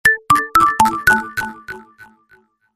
tin ton short message notification tone ringtone, sms ringtones